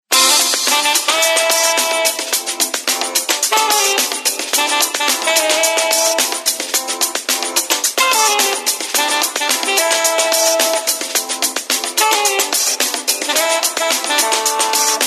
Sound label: Jazztastic; Motorola W766